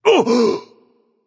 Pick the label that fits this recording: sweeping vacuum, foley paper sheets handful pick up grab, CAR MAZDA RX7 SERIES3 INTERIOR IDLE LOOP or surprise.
surprise